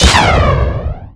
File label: crit received; cstrike; tf2